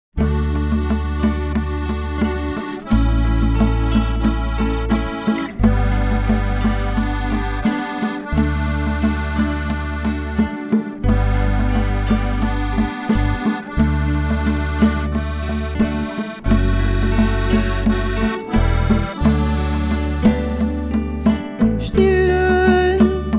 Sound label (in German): singspiel